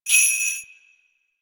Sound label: Sleigh Bells Single